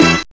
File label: action; MandelMusic